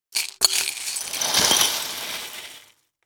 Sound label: Breakfast cereal pouring in a glass bowl